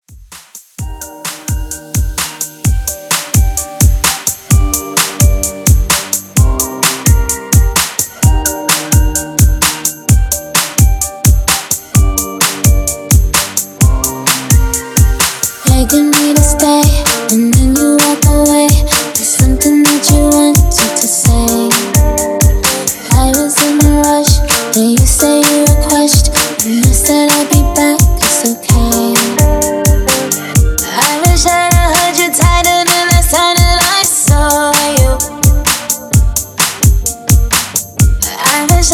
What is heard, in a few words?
129 BPM